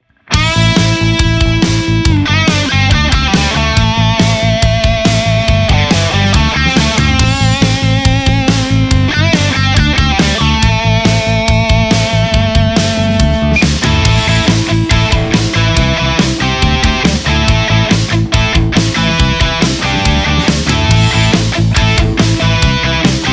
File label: Backing Track Solo; Two Notes Torpedo Captor X